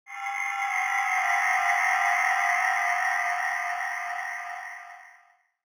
Elevator Down

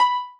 marimba rainbow; ゆめ2っき

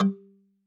marimba kalimba; ゆめ2っき

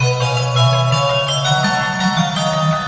chimes; ゆめ2っき

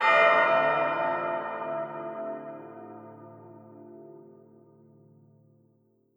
Bell

